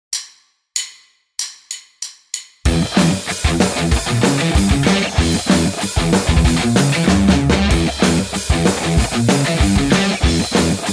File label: Riff, guitar; Pickipedia: learn to play 23 rhythm guitar riffs